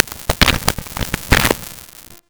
Day Branch Crack Small; Ambience; One Offs; Glades Of Andurin

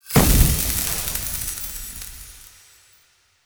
Starter Melee Rune Activate Burst; Powers; Runes

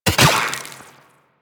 Shot, Weapons; Warframe; Moa Pet; Cryotra

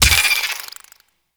Infested Burst Rifle Headshot, weapons

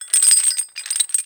Swing Chain Move; Ambience; Dungeons; Under City

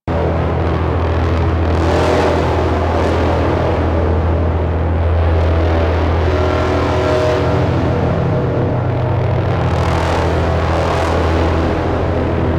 Ship Interior Ambience; Warframe; Space Battles; Fomorian

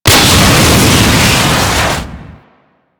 Tunnel Fire Start; Ambience; Grineer Spy Vault; Warframe; Grineer Galleon

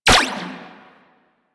Shot, Bladed Pistol; Warframe; Tenno; Akjagara Prime

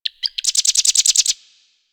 Forest Bird Squak; Warframe; Ambience; Grineer Forest

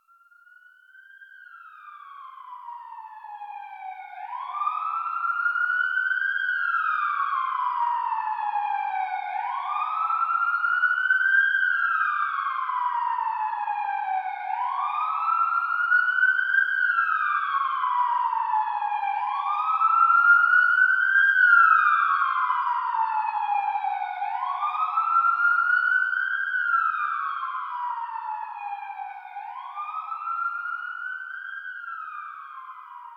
Exterior Distant Ambient Siren; Ambience; Warframe; NineteenNinetyNine

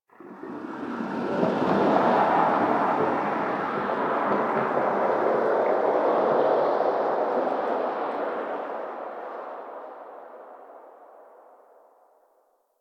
Rail Jack Exterior Earth Space Wind; Ambience; Earth Region

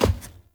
Heavy Grunt Boot Walk Wood, footsteps; Sinecure

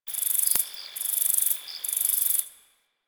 Cricket

